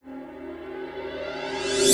Reverse

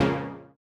Housey Stab C#2; KG MegaSaur

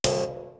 TAMTAM MUTE; East West; Global Sounds

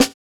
Snare, JUNGLE BREAKS

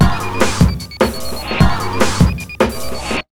150, Big Beat Drumloops; USB Soundscan vol.25; AKAI

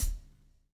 SLIK

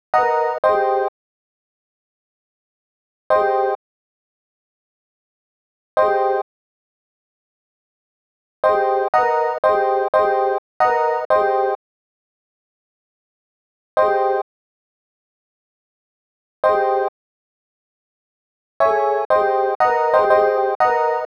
E Piano, hip-hop; Sonic Foundry (Sony Creative Software); Hydroponic Hip-Hop; Keys Synth; Pianos

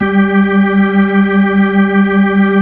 B-3; Roland LCDP10 Keys of the 60s and 70s 2; Full Xfade

